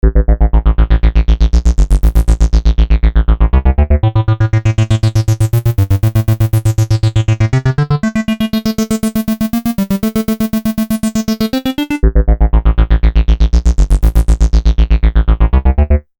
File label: synth